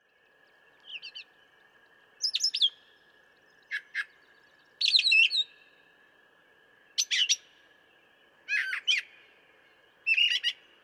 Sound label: Brown Thrasher